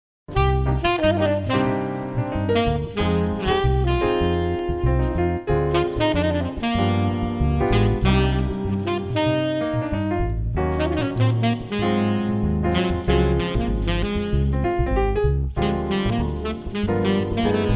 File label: trio sax